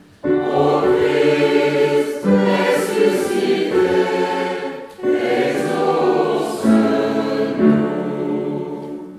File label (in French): O Christ ressuscite Tutti